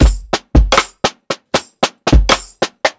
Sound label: PICCOLO LAZY, breaks, drums; Basement drums vol 2